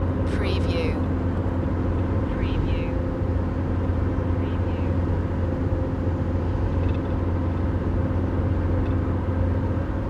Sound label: van ride loop